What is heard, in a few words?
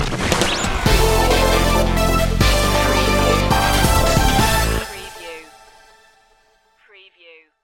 diy sting